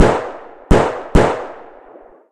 rnd shooting, ambient outdoor

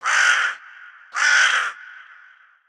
crow, outdoor ambient

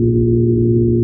device hum; ambient

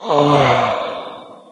die, mp actor

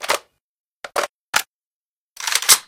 lr300 reload

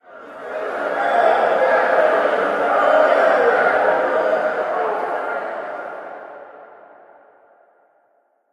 crowd wave, arena

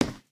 floortile, human step

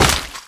impact wpn large mud

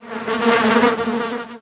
fly, outdoor; ambient

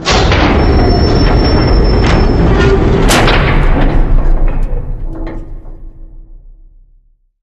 underpass opening

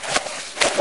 fn2000 draw, weapons

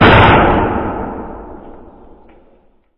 hit; ambient

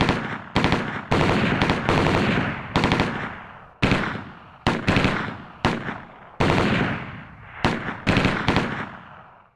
gunfire, ambient

